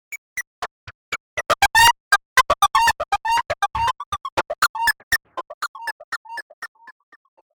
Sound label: 8-Bit Robot; Robot Sound Effects; science fiction sound effect; Andrew Fleming